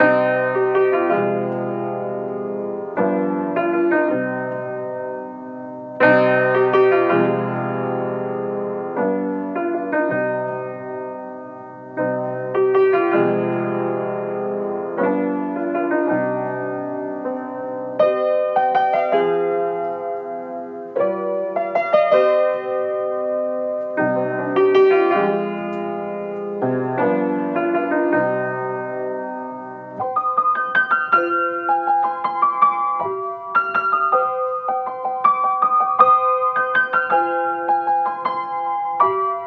piano, 80 BPM C Min; Lisbon; Cymatics; Bloom Piano Compositions

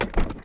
Door Close; Game Essentials

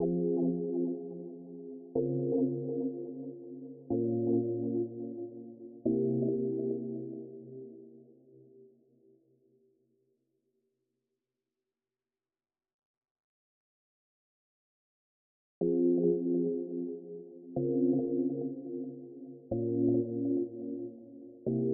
123 BPM F Min Pad, Hip Hop; Melody Loops; Loop Stems; Cymatics; Vuelve

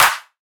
Dubstep Clap; Cymatics; Dubstep Starter Pack; Drums - One Shots

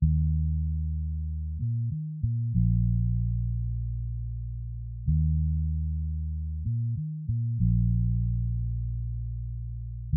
95 BPM D# Min Bass, Hip Hop; Melody Loops; Cymatics; Hip Hop Sample Pack; Fina

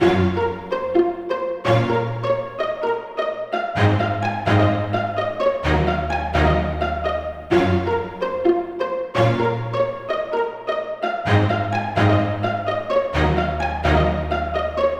House String Loop 128 BPM A# Min; Instruments; Loops

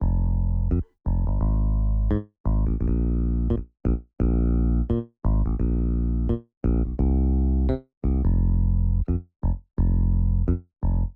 Classic Melody Loop - 86 BPM F Min Bass; Cymatics; Oracle Sample Pack

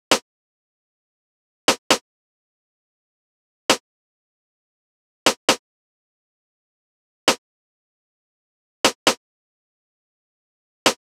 Drum Loop 134 BPM Percussion, Garage; Loop Stems; Cymatics; GHOST (Beta Pack); Drain Drum Loop

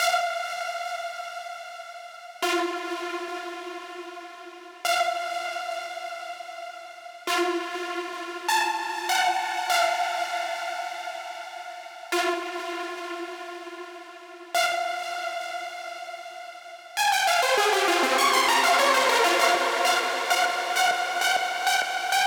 99 BPM F Min Lead; Cyber Collection; Vengeance

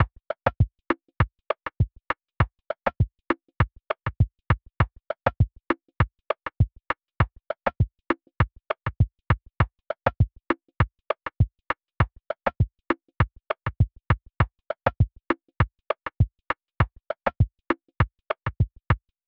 100 BPM E Min Percussion, Afrobeats; Melodies; Cymatics; GHOST (Beta Pack); Alora

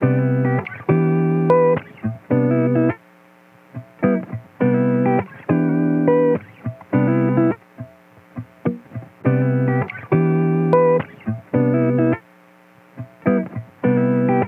Electric Guitar - 104 BPM, dry; Melodics; Cymatics; Eternity Sample Pack; Guitars - Electric

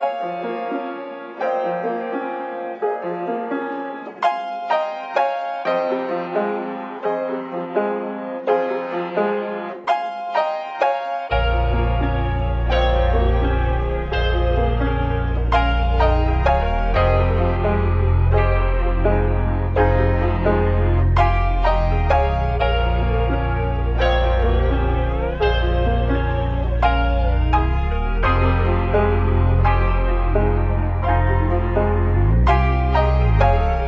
85 BPM F Min, Vintage & RnB Melodies; Rushing; Cymatics

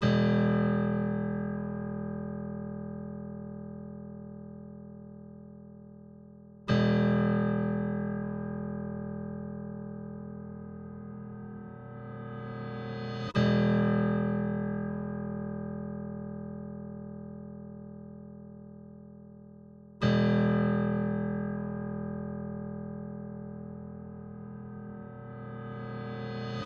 144 BPM E Min Piano, Drill; Cymatics; PARADOX II; Melody Collection; Casket

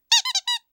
Rubber Cat Toy Squeak; Fairlight Sound Lib

